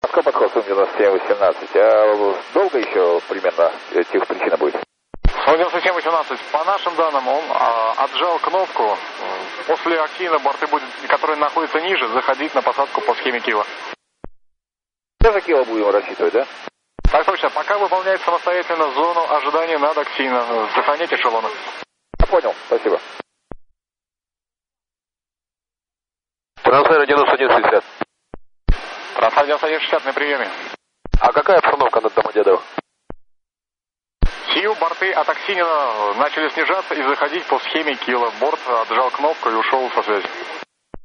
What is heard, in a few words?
radio jam